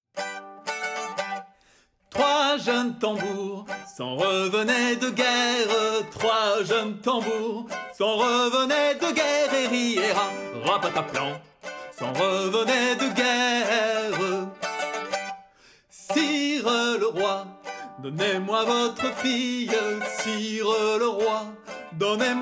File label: Tavern; assassin s creed unity gamerip 2014